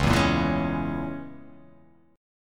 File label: Db Minor 6th, Dbm6 chord; Dbm6 strummed; 9,7,8,9,8,8,x,x; JGuitar